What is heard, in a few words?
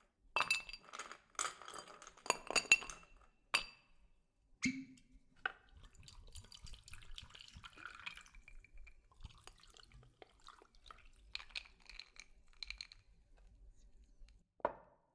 pour whiskey; Film Noir; SoundPad